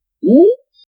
quiz question correct sou; feedback

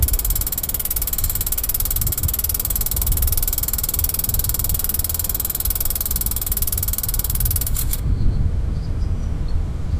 noise of a old bicycle

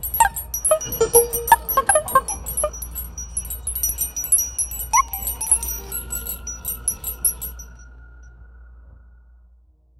hyper realistic joyful di, emotional

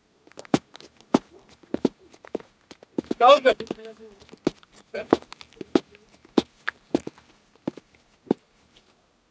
a man being alerted by; approach

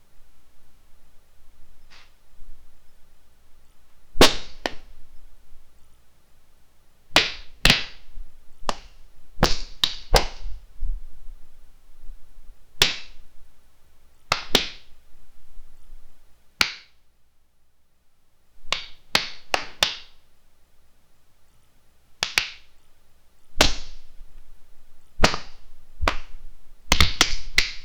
sounds of boxing fight

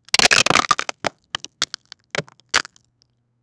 generate a sound such tha, ice

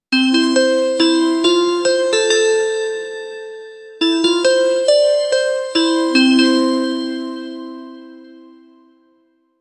catchy piano keys or bell

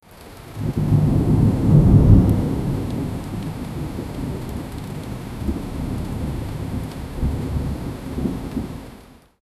atmospheric heavy monsoon

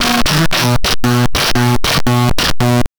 disruption of main module, glitch

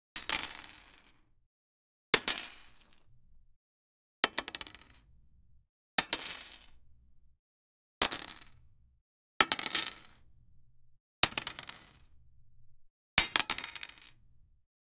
pieces of heavy metal, clatter

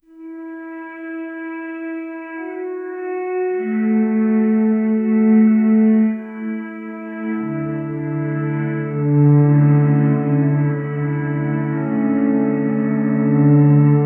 slow quiet cello rambling

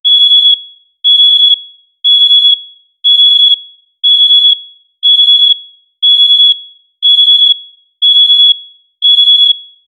a daily life sound that

